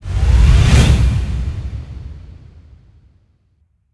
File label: drive Through Reels; netent; multipliermayhem